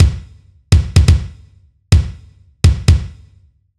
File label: new wave drums